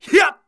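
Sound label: Mon Man ATTACK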